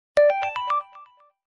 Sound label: notification message sms